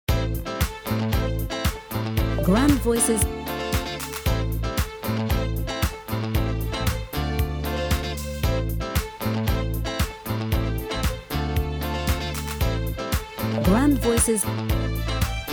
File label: music happy